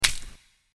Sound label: wet splat